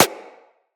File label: Bullet Impact Generic